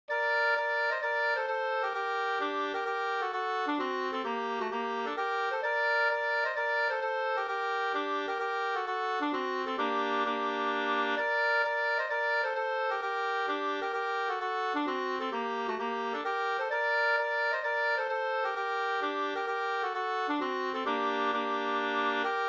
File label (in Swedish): hambopolska gmoll